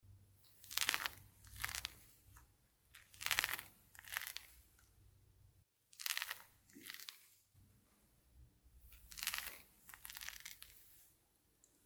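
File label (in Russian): хруст больных коленей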